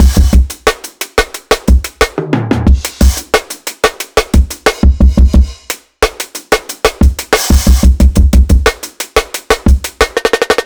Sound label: Jungle Finger Drumming